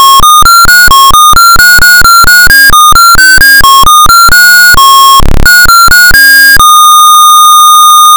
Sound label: level complete